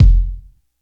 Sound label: long room reverb kick sample a sharp key; Duration 0:01; Stereo; kick drum samples